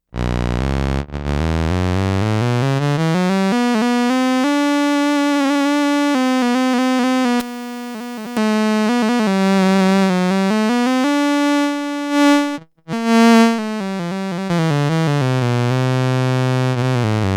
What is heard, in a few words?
Cello, Roland SH-2000